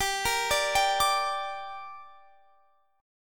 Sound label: G Minor G, Guitar, Standard; arpeggiated; JGuitar